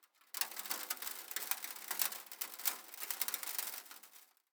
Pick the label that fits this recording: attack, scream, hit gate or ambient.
ambient